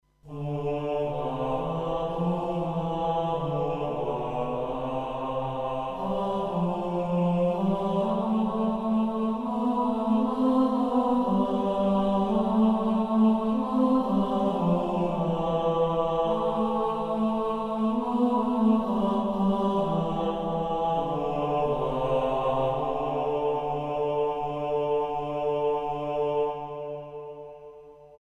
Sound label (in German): Sinfonietta Gestalt gregorianisch mit Chorsound